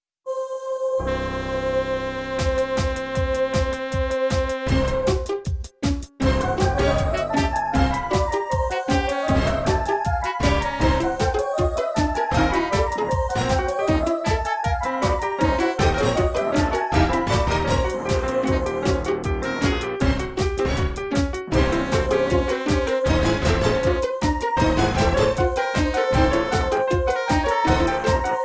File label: instrumental